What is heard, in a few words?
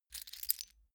key ring large place soft